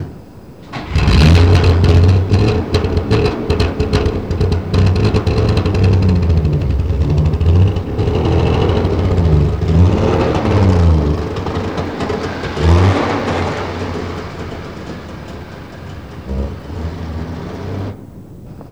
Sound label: motor, trabant; gamlasidan